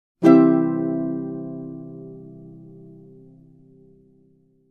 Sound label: Happy full chord strum